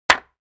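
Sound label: 2x4 Hit Hammer On Cement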